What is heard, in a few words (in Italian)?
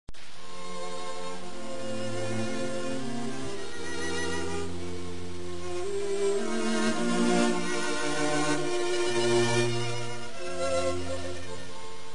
andante cantabile